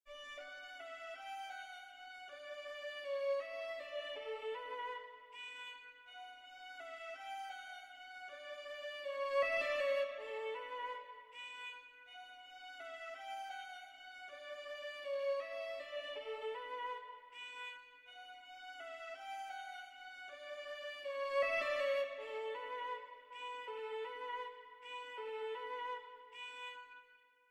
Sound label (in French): Violon; Les Valkyries